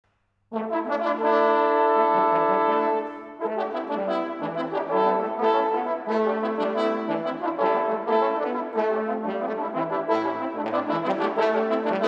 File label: trombone